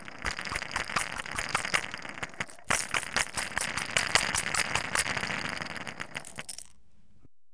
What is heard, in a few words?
BABY